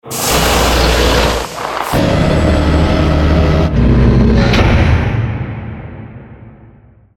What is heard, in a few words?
boss throne close; inFamous; PS3; reaper